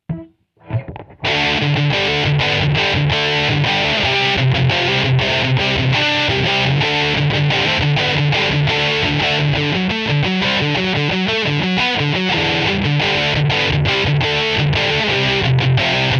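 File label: high gain bridge filter pos. 4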